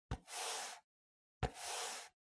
PC Mouse Move Soft Pad Hit

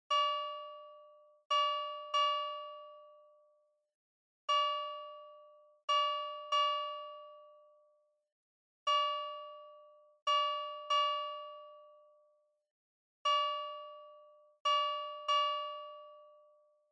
Fire Truck Siren Dry

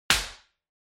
Hit Slap, Dry

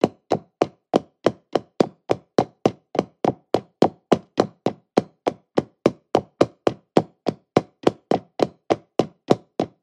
Footsteps

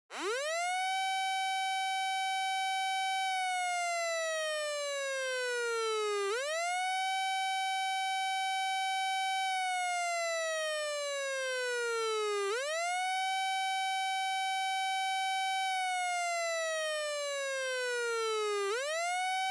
Police Car Siren Dry

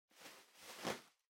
Packaging Air Cushion Far Rustle; 梱包用エアークッション; 遠い ガサガサ; 梱包材

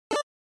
Shooter Item

